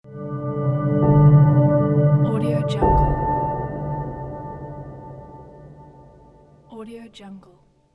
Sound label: logo effect news